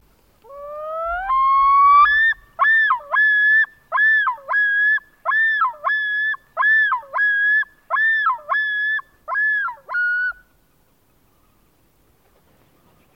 suara burung lun

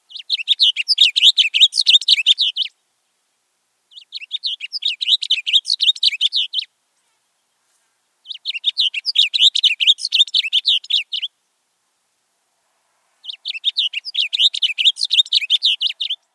suara kardinal biru versi 2

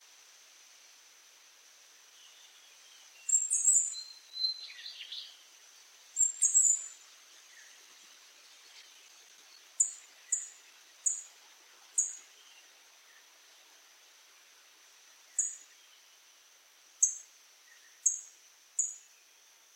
suara burung cabai lombok

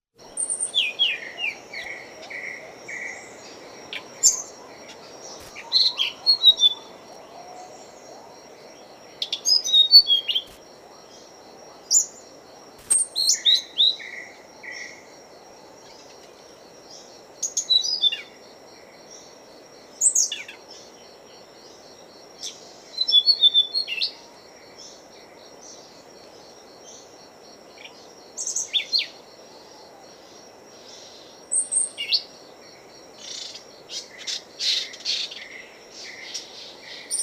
tledekan gunung betina

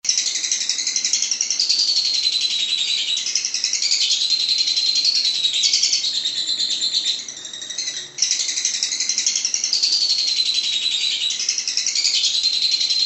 lovebird untuk kacer murai cucak hijau

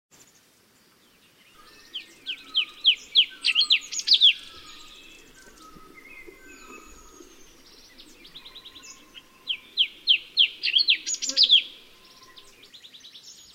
terapi cucak cungko

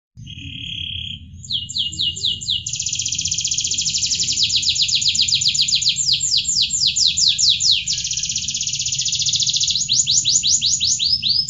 audio kenari standar kapal oleng original jeda 1 menit

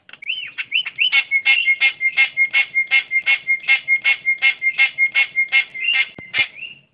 pelatuk bawang

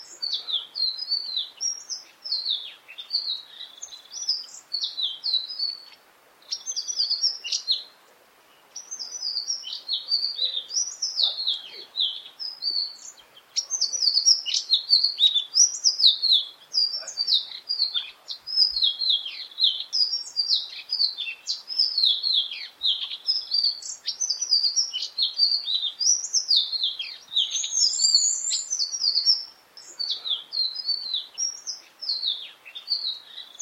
sikatan ninon

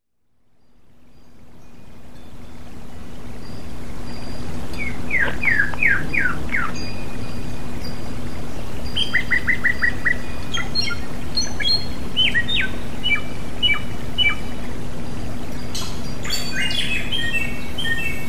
suara terapi samyong

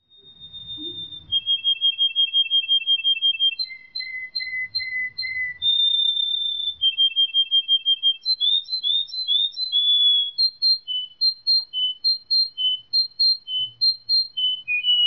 kombinasi rusia orhpeus

